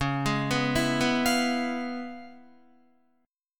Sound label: Db 7th Sharp 9th Db, down, Guitar; arpeggiated; 4E,4A,5D,5G,5C,6F; JGuitar